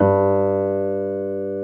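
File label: piano G1, soft piano; AKAI S-Series CD-ROM Sound Library VOL-2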